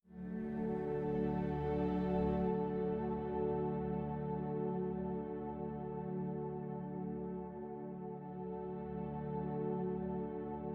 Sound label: loading loop; Armello The Bandit Clan